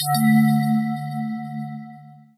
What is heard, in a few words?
warn; Golden Crayon Sounds